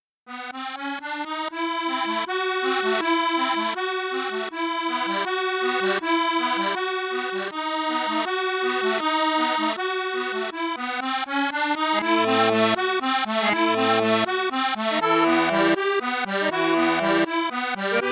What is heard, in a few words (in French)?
registre flute juste flute haute flute basse, cavagnolo bal musette accordeon